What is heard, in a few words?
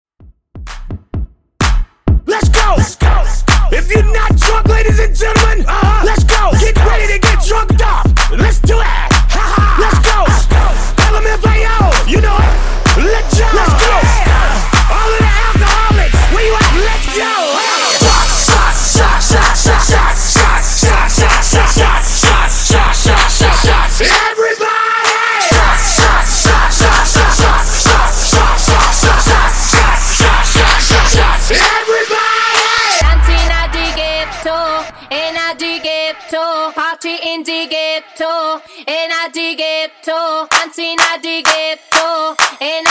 Funk Slam Segue 128Bpm